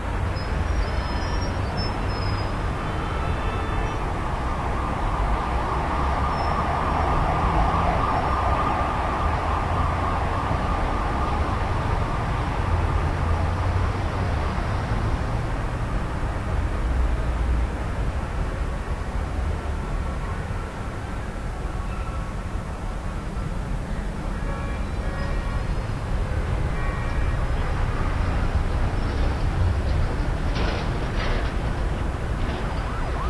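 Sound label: city ambience low; de poty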